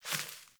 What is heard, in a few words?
Sparrow One Wing Flaps; Animals